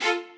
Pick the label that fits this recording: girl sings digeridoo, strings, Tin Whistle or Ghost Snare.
strings